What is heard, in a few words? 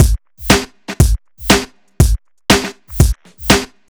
Drum Loops, 120 BPM, House & Electro; Underground UK House; Sonic Foundry (Sony Creative Software)